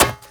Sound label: land, metal; gameplay; materials